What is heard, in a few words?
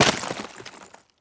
ice break; teardown; ps5 windows xbox series xs